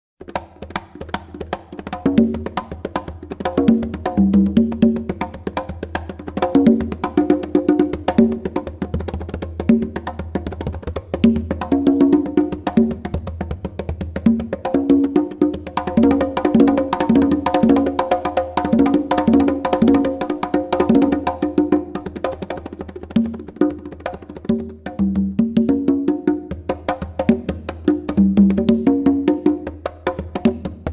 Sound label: Konga Solo